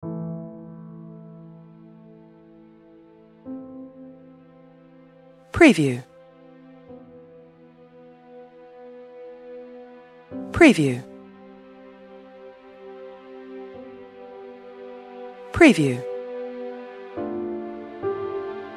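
Dramatic Emotional Sad Piano Strings Background Film Soundtrack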